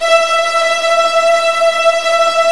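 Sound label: viola tremolo E3 left, Virtuoso Strings; ILIO; Kirk Hunter Virtuoso Strings VOL-2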